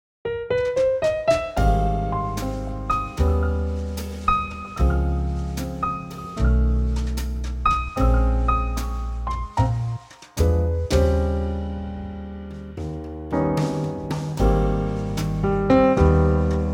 Ab Trio

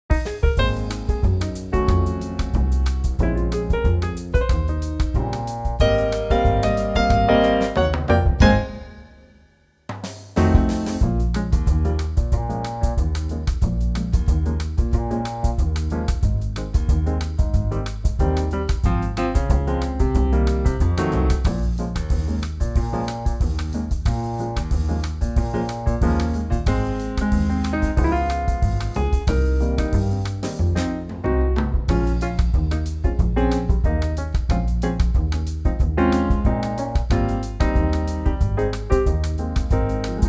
Ab Trio

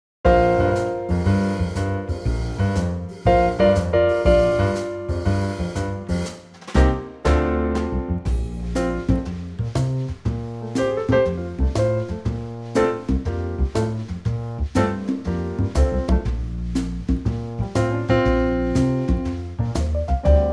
Trio congas Bb

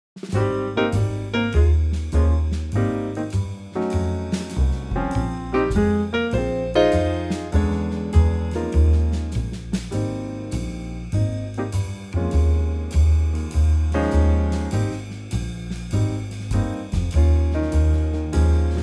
Ab Trio

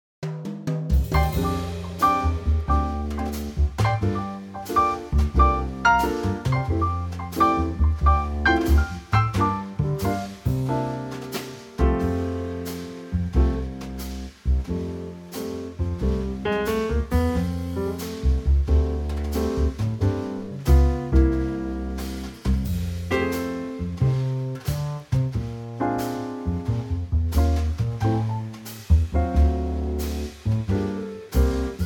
F Trio

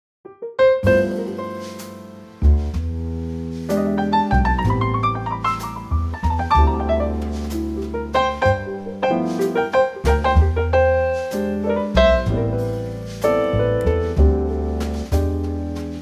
G Trio; key - G - vocal range - D to F

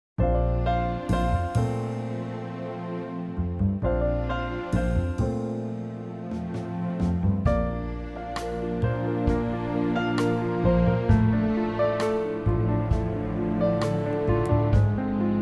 Orchestral Bb, male

